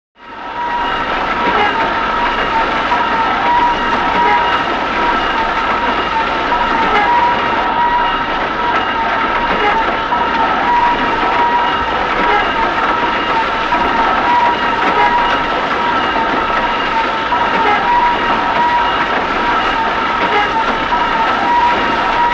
Dredger; Dredger At Work; earthmover

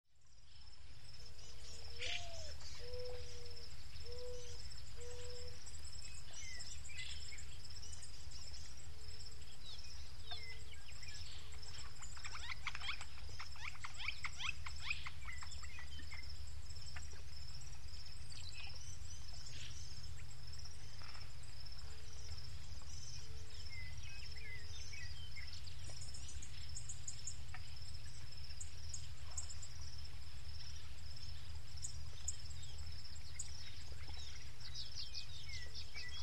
Heavy Water Flow Pipe, pipes

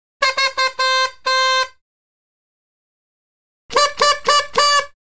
Horn 2 Toy Horns Honks

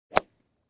Golf Ball Hit

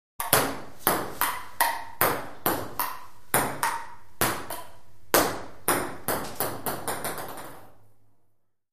Ping Pong Ball Hit

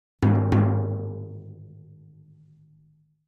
Drums Toms B Accent

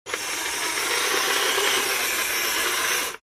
toy motor; toys

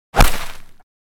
Bullet Impact Sweetener, Into Dirt

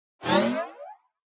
Pop Up Elect Bounce Re, pop up element

